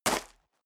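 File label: step dress boots gravel medium single left, footsteps; schritte; menschen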